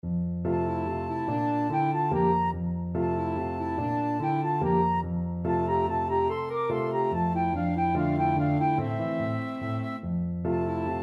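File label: flute alto sax, flute-saxophone duet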